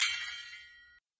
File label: ceng ceng; baris; impressionniste